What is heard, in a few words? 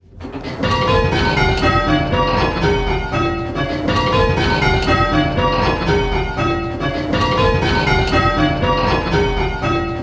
Striking 6-Bell Rounds; Pebworth